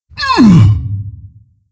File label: Wound, Creature; Steelbreaker